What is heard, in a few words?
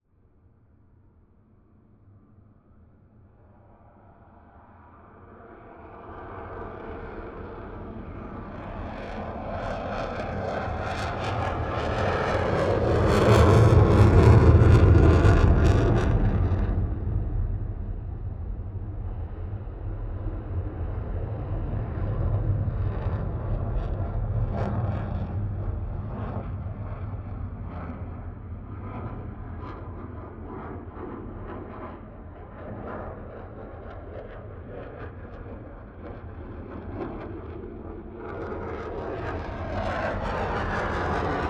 F-16 pass by (Contact mic, ORTF), F16 Maneuvers; Sound Ex Machina